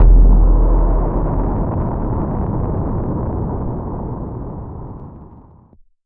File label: Monster alerted